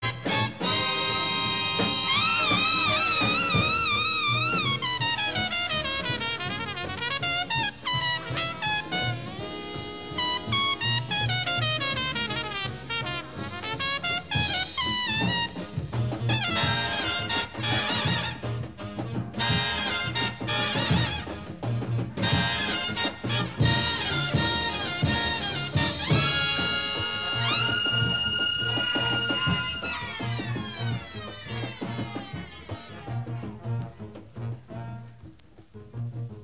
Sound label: Jazz